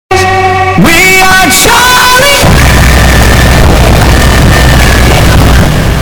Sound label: nightcore loud